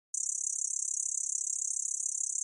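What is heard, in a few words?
Computer Read Out High Pitch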